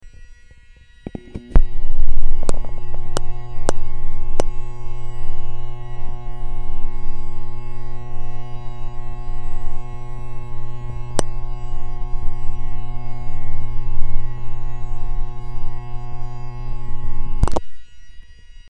bass, Bass Drone Chamber and Chanter with Contact Mic; Bass drone lower tuning chamber; Grainger; Bagpipe